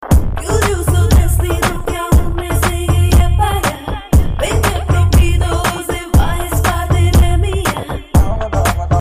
Tanjore Msg

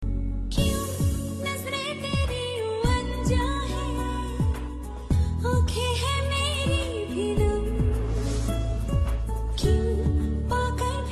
Female

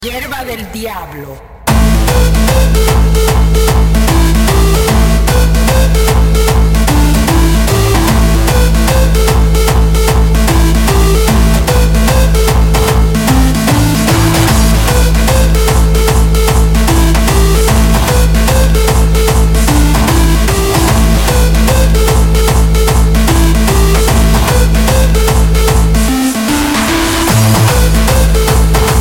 Bass Hardstyle

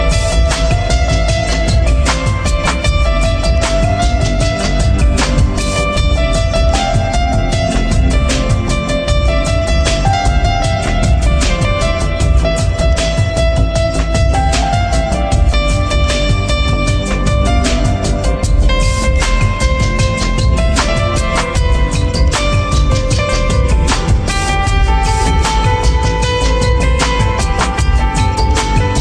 New Mahi Instrument

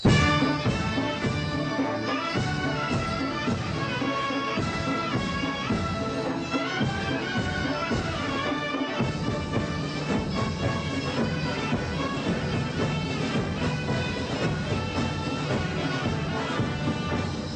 Mehter

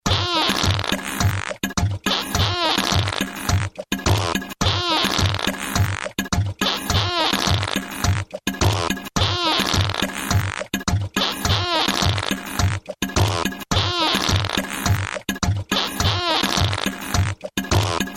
Reggaeton Fart

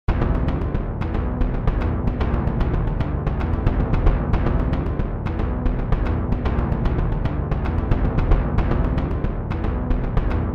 Jp drum Beat

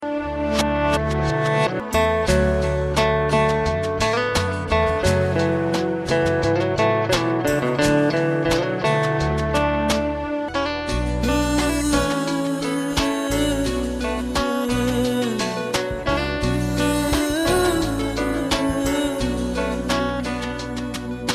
Romentic music

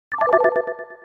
Boop; Wii Fit

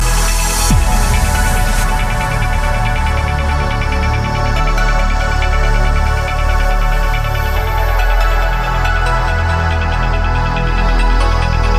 Chime

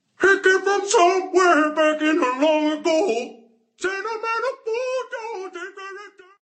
Bathtub Song

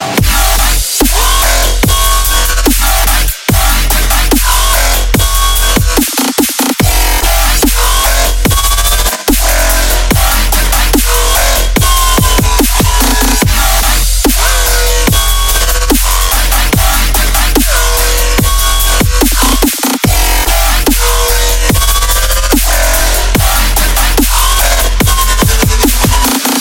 Dubstep

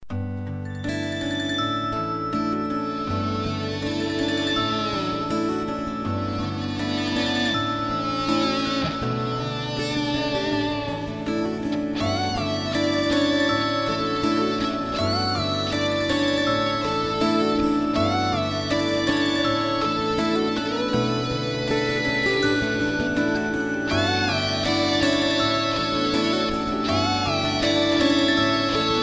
Nokia Remix; Airy

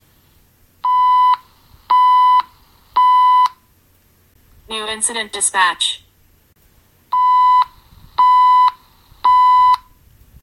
New Dispatch Alert

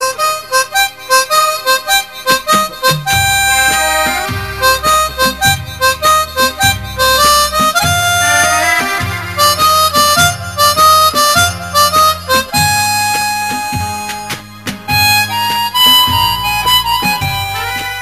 Mouth Organ

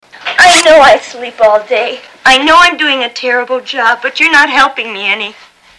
Whining Mistress

